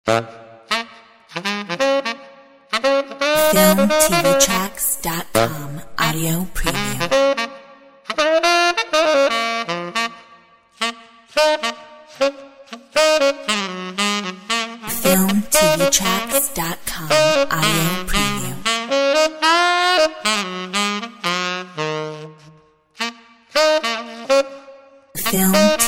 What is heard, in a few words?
Logopack Solo sax; Filmtv-tracks